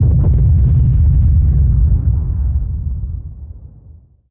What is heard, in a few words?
dist explosion; cstrike